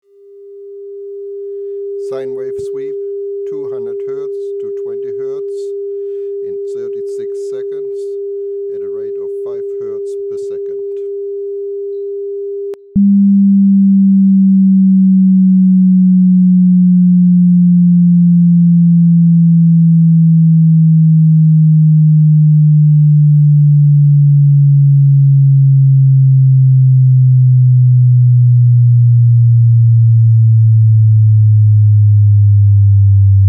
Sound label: 200-20Hz sweep